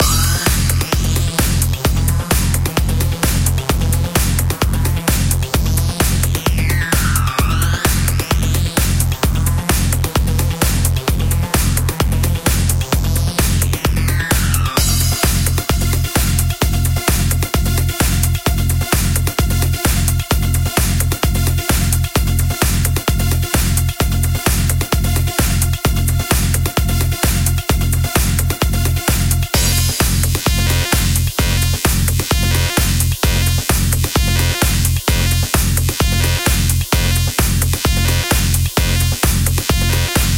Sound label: EDM